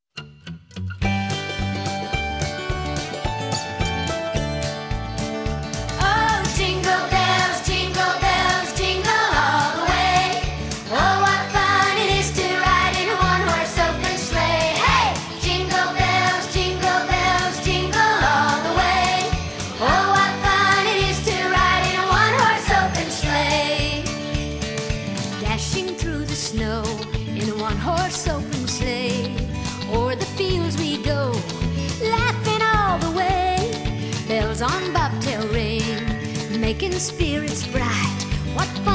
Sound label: Jingle Bells